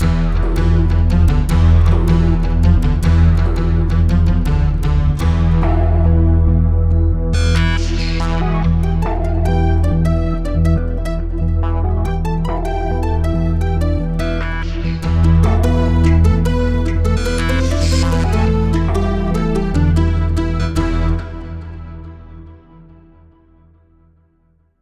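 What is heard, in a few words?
Wavestation Evolution, synth; KORG OASYS; oasys assault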